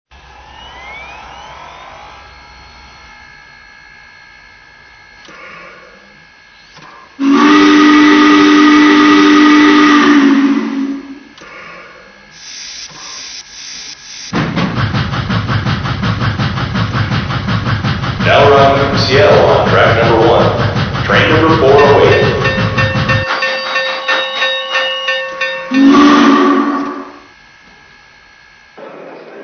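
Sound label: Challenger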